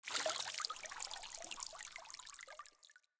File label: Water Shallow Stop Drips; Entity Contact Movement